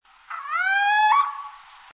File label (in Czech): Athene noctua, známým táhlým "půjd"; Zejména na jaře se ozývá; Mapování rozšíření sov na východním Bítešsku